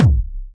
Boomy Bass Drum Sample C Key, Royality free bass drum sound tuned to the C note.